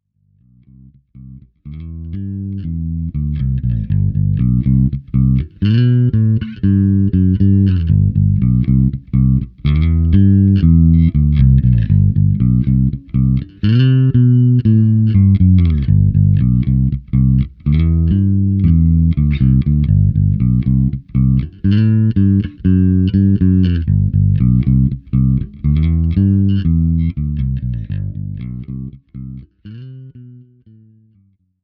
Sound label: fat tube